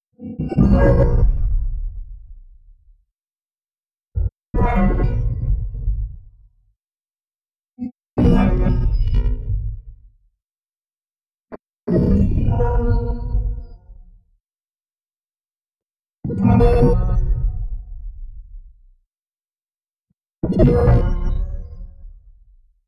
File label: Echo High Fluctation